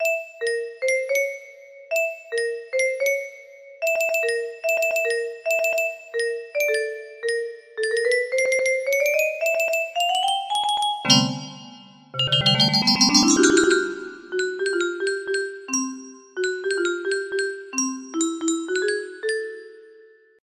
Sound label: music box